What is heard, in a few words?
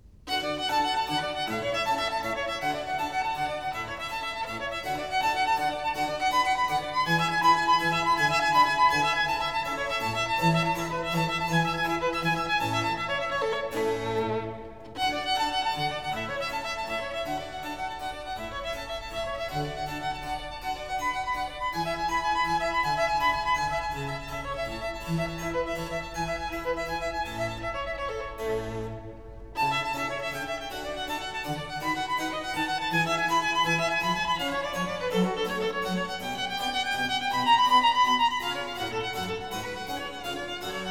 Sonate D Fantasia (Presto); Vivaldi